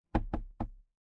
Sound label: impact wood knock